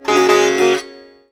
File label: SITAR GRV, World Colours; Best Service